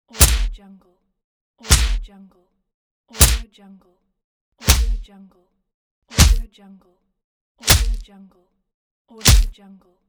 punches; imkmusik

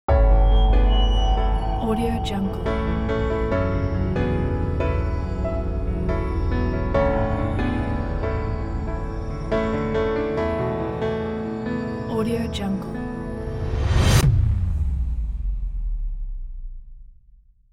piano cinematic logo

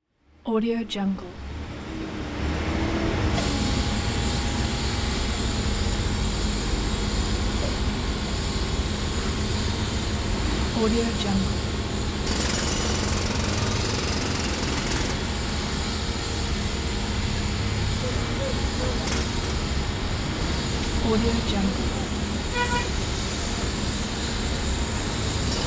construction site; capeaudio

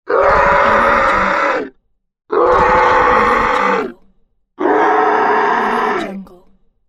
zombie angry scream; greenwaysound

